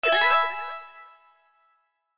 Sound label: Win Scatter; slots; Robot Vs Monster